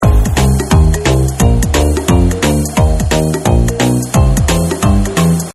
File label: end match loop; holidays; snowbrawl